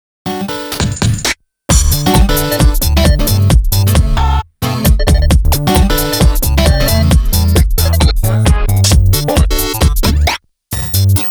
Character Select; milestone sound collection; bitcrush

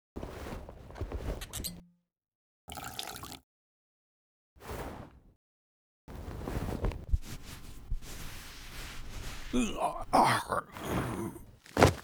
Cloth Flask; yesterday origins gamerip 2016 switch ps4 pc ios macos

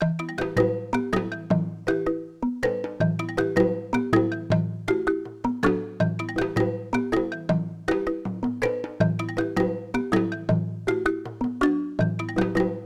Pacific Islander - South-sea; anno 1503 gamerip

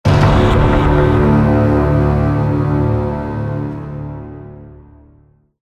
Fail Stinger; Call of Duty Modern Warfare 3

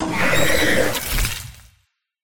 jumpscare; MiniHuggs; Minihuggy

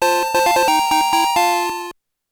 Fanfare - Item Obtained; pokemon gold silver crystal

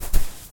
Footsteps footstep sand run; ProSoundCollection v1 3

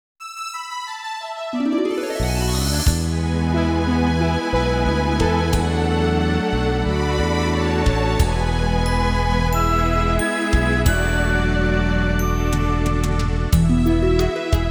Victory!; Wild Arms 2nd Ignition

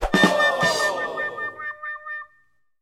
Popup Level Fail; rakoo friends wii u 2015

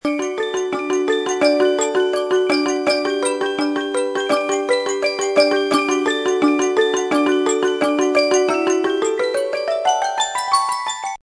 Loops - Xylo; Scratch

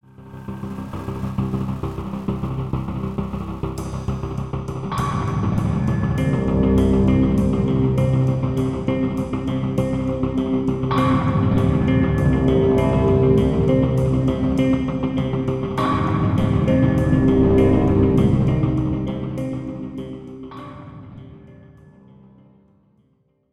Round Start; Fortify

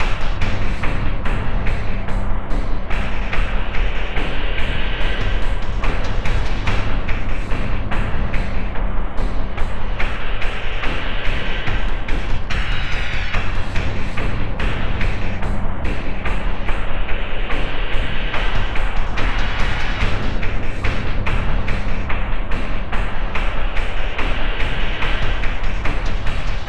Boss Theme; Insanely Twisted Shadow Planet

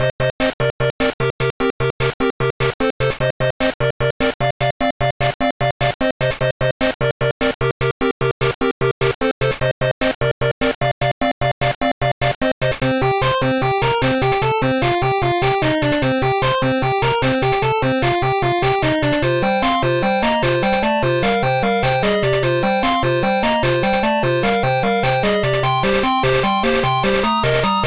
Ride Raptors BGM; bakuryuu sentai abaranger; pico

